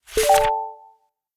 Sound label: page forward